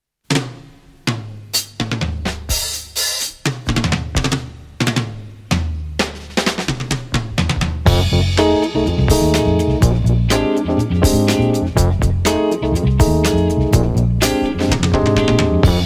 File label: shakuhachi & koto jazz funk